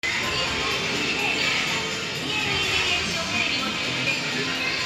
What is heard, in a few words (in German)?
laut